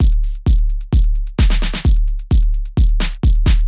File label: clap highlights, edm drum